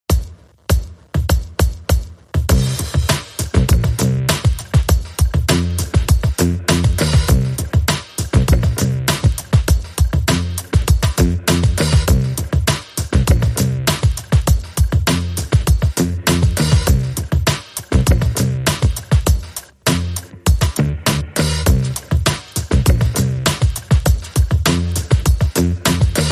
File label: Drums and Bass